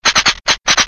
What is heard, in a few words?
dryfire